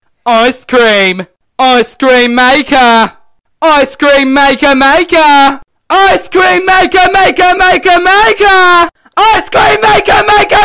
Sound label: music